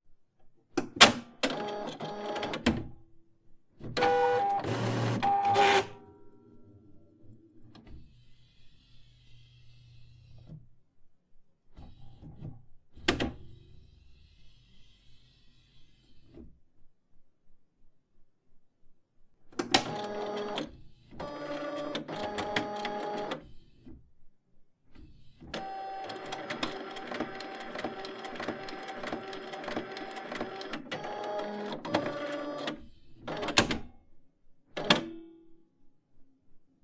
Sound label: inkjet printer canon s6300 on and off